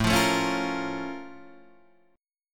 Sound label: A sharp Minor Major 7th